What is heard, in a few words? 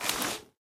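inventory items cloth; STALKER; IWP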